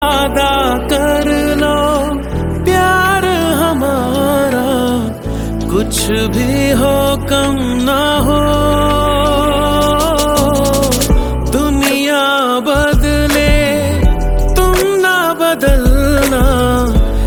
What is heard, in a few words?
Ringtone